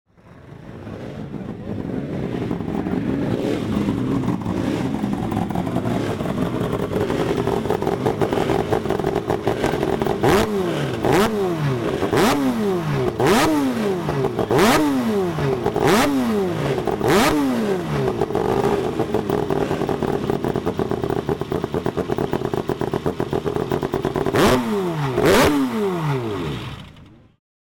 Norton Rotary, bike

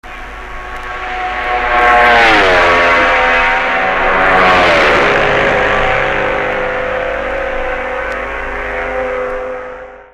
MV Norton Jawa, vintage bike; 1966

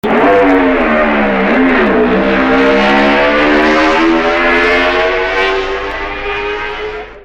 Honda 250; 1961 Honda 250

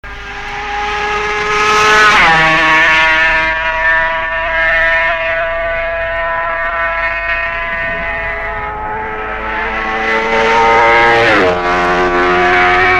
Suzuki Honda Yamaha; 1964

